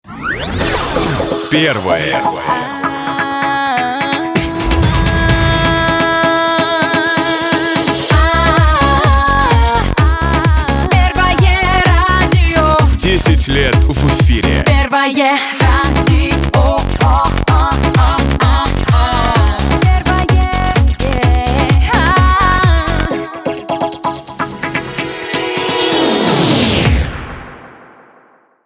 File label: radiojingle